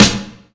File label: airy sizzle snare drum g sharp key